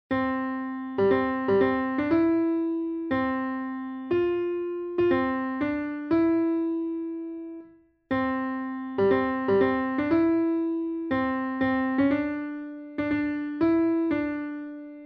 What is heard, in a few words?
Railway Melody